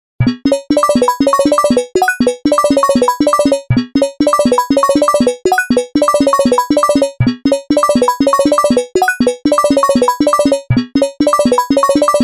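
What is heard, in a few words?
Exotic percussion, Chromatic hits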